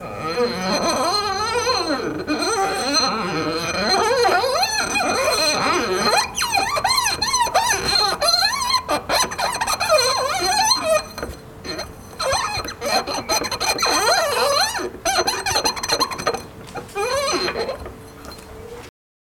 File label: Squeaking Glass